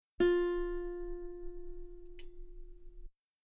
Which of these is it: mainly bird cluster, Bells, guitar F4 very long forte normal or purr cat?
guitar F4 very long forte normal